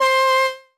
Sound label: Synth Brass; puchicon; dsi ds